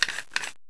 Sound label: kriss foley